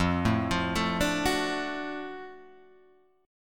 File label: F sharp Minor Major 7th Sharp 5th